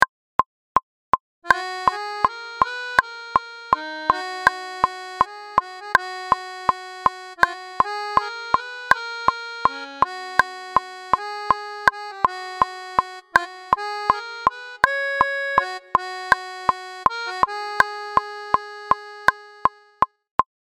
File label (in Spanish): ACORD, acordeon norteno